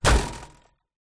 impact fan basket head

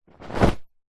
clothing suit trousers pants drop

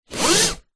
vehicles car seatbelt pull short toyota rav4

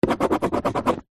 pen ball point write scribble on empty card box; office

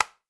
household switch light flick; sound effects one

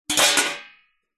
household baking tray metal impact drop

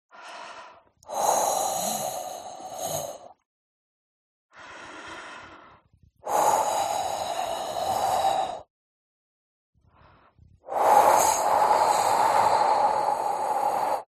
HUMAN BREATH Female Blow Heavy; smartsound

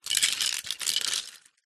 leisure toy metal cars pile push around carpet; zapsplat

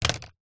industrial tape measure plastic set down

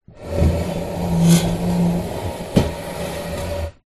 household chair dining slide tiled floor

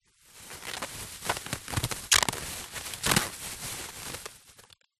foley plastic bag very thin handling squeaks; zapsplat

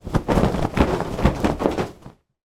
blanket shake; zapsplat

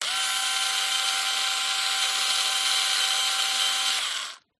screwdriver electric; Skyclad Sound

